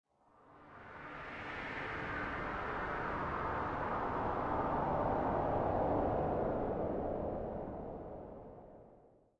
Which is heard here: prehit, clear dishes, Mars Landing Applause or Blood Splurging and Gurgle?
prehit